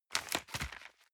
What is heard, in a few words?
envelope swap hard